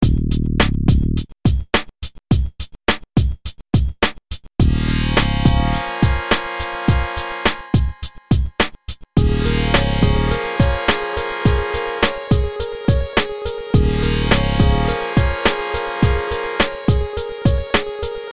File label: Super FM